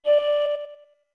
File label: Notes Flute D med; OOT